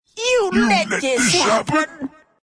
death; dota; ogre magi

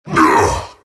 morph grunt; terrorblade

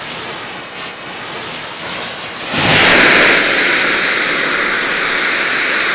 homing missile; weapons; dota; gyrocopter

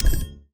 weighty top menu; ui; dota

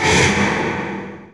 soul assumption cast; visage

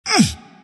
grunt; dota; ember spirit

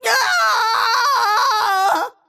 death; snapfire

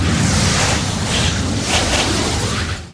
mini tornado; weapons; dota; invoker

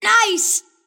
lasthit pain; kidvoker; dota; invoker

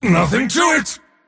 move pain; dota; mars

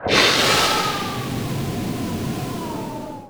splinter blast projectile; winter wyvern

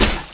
arrow impact; weapons; dota; drow ranger

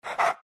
pant small, greevil; npc; courier

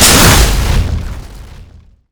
burrow attack; weapons; dota; sand king

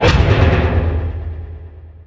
dissimilate appear; void spirit

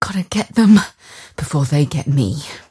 attack pain; dota; mirana

